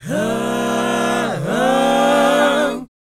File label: BOYZ F#, Vocal Planet R&B & Dance; ILIO